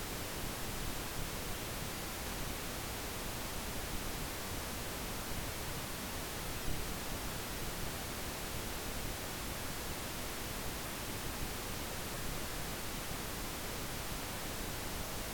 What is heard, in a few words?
Dolby -20dBFS RL 7 1